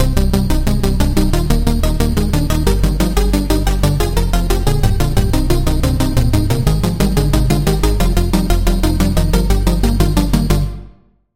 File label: Key